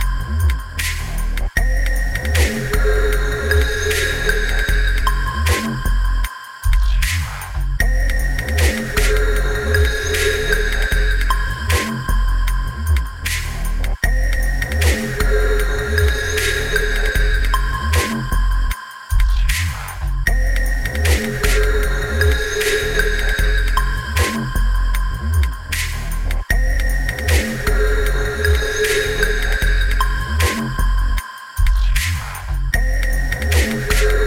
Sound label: dubstep